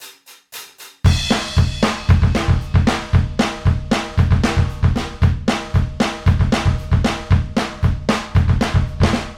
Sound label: drum normal